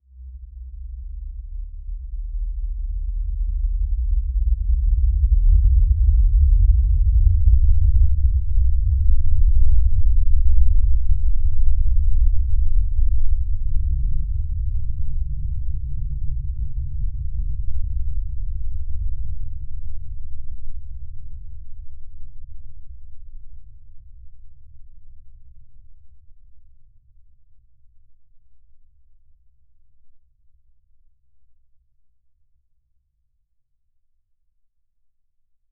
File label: a organic thing charging